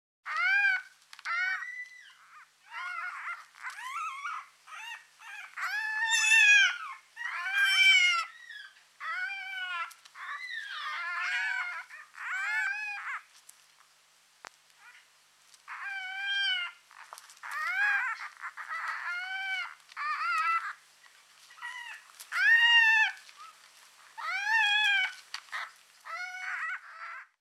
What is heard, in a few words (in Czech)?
Liska obecna liscata v nore